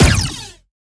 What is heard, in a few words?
Sciweap Shot Small Blaster Energy Thrower